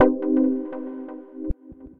Spark Stab, Synth Lead; Ableton; Factory Packs; Punch and Tilt

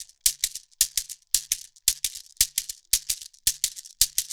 111 African Shekere; World; African Percussion

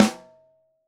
SN Top, Snare; Ableton Foundation FreePacks; Snare Designer

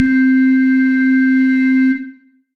Live Room Drawbars, Piano & Keys; Ableton; Factory Packs; Chop and Swing

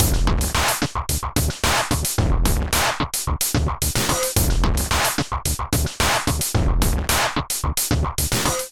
Kit groove 110 bpm, Drum; MIDI Clips; Abuse; Ableton; Drum Essentials

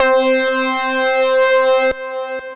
Bristle Lead, Synth Lead; Ableton; Factory Packs; Chop and Swing

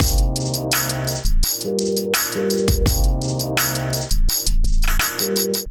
kit groove 84 bpm; MIDI Clips; Gotta Feel Kit groove; Ableton; Factory Packs; Beat Tools

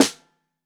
SN Bottom, Snare

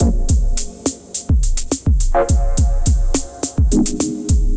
Dub Techno ambient drum groove 105bpm; MIDI Clips; Ableton; Factory Packs; Drum Essentials

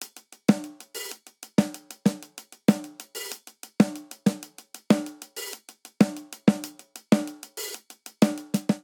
Simple Seven Snare & Hihat 7_8 190 bpm; Snare & Hihat 2; Ableton; Factory Packs; Drum Booth

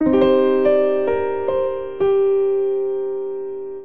Phrase Pentatonic C Minor 120bpm, Grand Piano; MIDI Clips; Ableton; Factory Packs

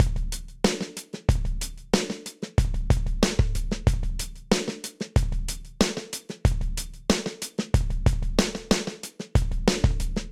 Main Old School 93bpm, Session Drums; Clockwork; Ableton; Factory Packs; Session Drums Studio

